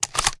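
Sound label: reload empty start sideload, shotgun; reloads; iw8; romeo870; gmod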